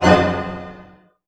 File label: D# DIM, Orchestra, ORCH HITS; Sound On Sound; Peter Siedleczek's Orchestra